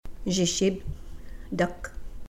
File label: DUCK